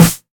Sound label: sparkle acoustic snare sound E key